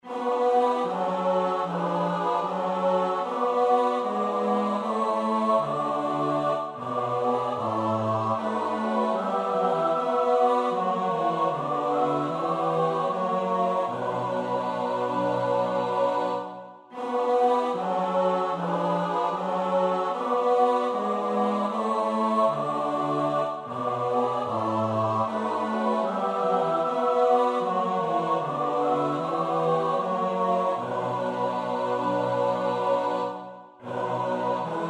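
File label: soprano alto tenor bass; Bach (Johann Sebastiaan); BWV 092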